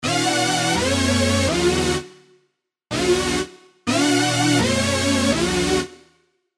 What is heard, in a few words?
serum 2 house stabs; Easy Rider; alonso saloon serum 2 house stabs